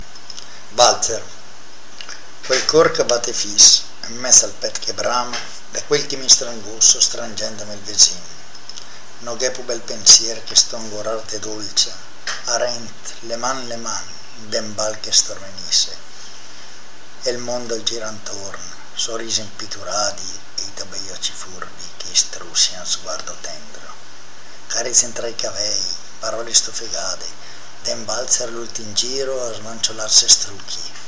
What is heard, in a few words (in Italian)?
valzer